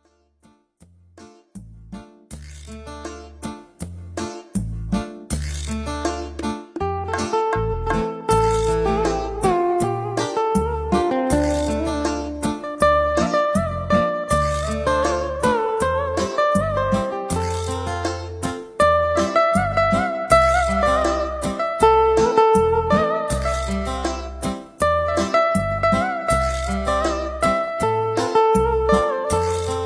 Nhạc Không Lời Xẩm Phòng Trà Bolero Xẩm Guitar Thư Giãn

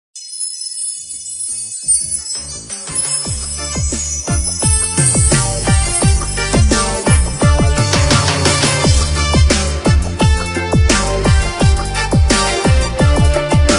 LK Nhạc Sống Organ Đám Cưới

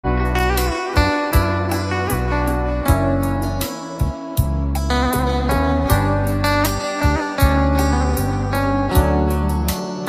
LK Nhạc Ghi Ta Hòa Tấu Bolero Trữ Tình

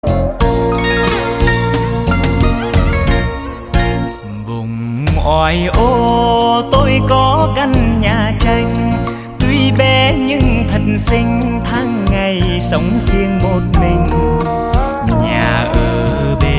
Nhạc Chuông

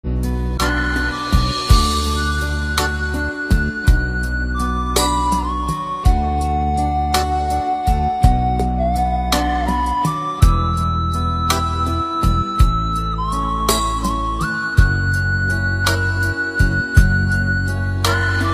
Hòa Tấu Không Lời Hòa Tấu Rumba Hải Ngoại

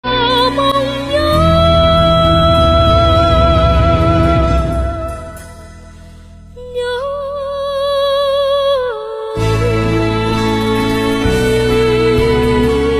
Liên Khúc Nhạc Đỏ Trữ Tình

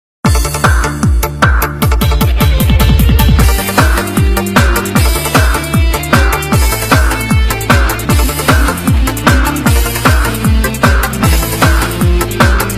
Nhạc Sống Nhạc Trẻ Sôi Động

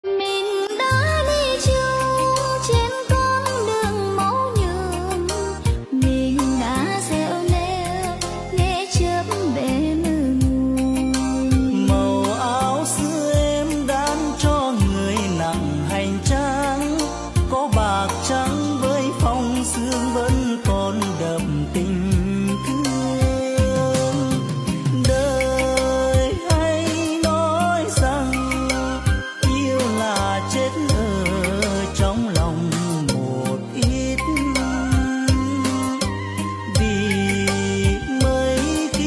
Liên Khúc Nhạc Vàng Xưa

